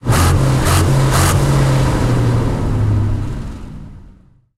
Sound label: su 100 engine load, tanks; War Thunder